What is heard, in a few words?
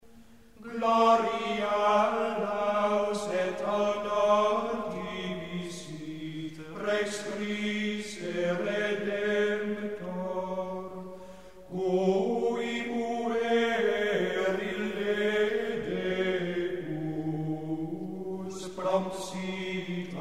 Hymne; Kyriale; Gloria Laus